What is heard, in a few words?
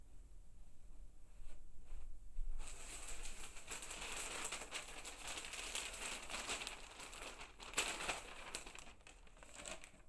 Newspaper, binaural